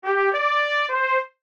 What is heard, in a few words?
trumpet